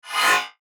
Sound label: FLASH ELEMENT HIGH TECH; Multimedia; Blastwave